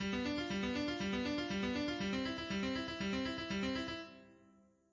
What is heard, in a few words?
arpeggio up